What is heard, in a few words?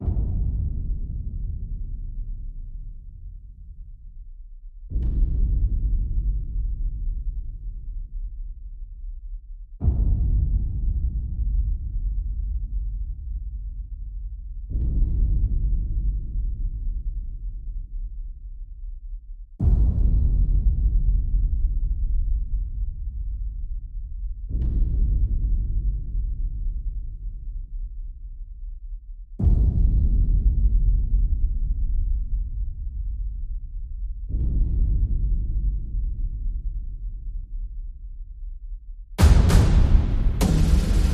Drums SFX and Brass Stabs Only